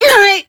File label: pain; awesomenauts